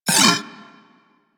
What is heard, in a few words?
Vocal Pain; Warframe; Corpus; Mini Moa